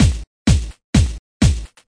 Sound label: breakdown, 127 bpm, drum loops and breaks